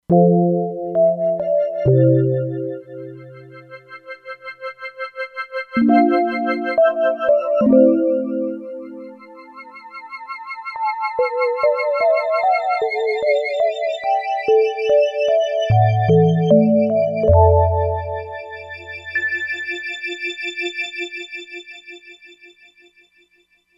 E-mu Proteus-2; Factory Presets; Sympathetic

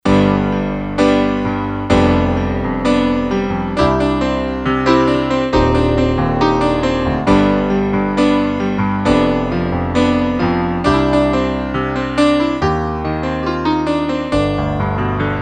16' Piano; KSDU-013 Combined Groups

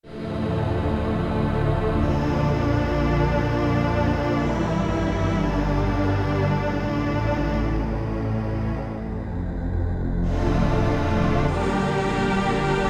Deep String Section; Yamaha TX16W

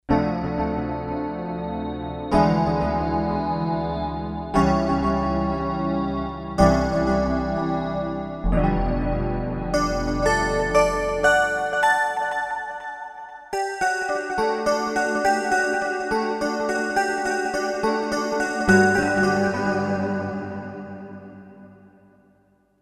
Kawai K5000S; Esseter

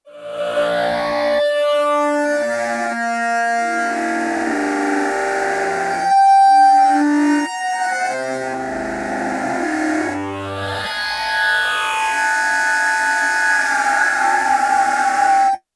Backwards 60's, Roland JD-800; Factory Patches

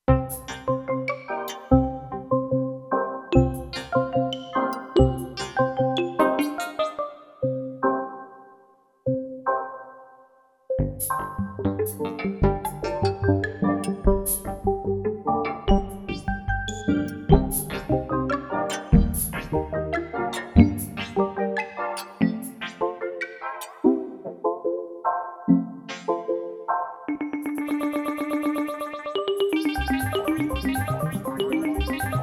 Wave Power, Kurzweil K2500X; Factory Programs

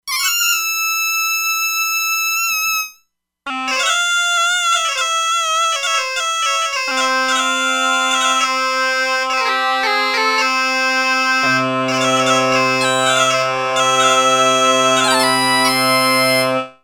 Asian Reed, E-mu Proteus-2; Factory Presets

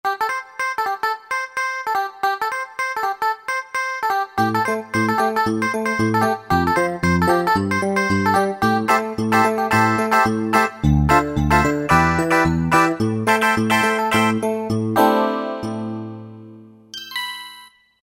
Seq E.Piano, Roland JD-990; Factory Patches

